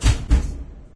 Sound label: upgrade; Tank